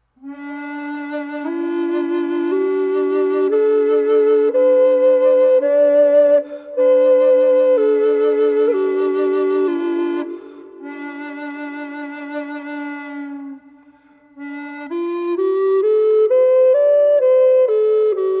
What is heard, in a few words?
birdseye d drone wet, flutes; Laughing Crow